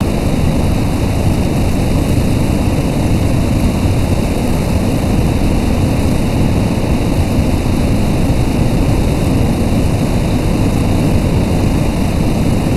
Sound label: Big Waterfall Far; gnarled forest